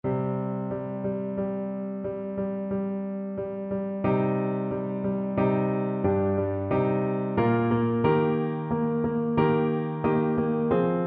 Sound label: pavane, Clarinet; capriol